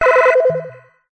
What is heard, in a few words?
science fiction computer; Audio Mangler